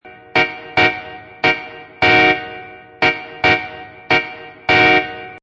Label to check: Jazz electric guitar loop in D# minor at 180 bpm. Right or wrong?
Right